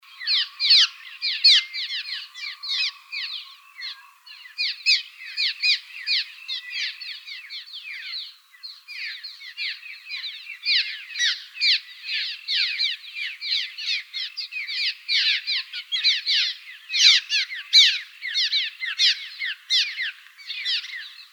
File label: Larks Swallows; CLSW; deviche